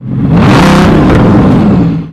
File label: Bugatti Revv